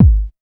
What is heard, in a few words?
dance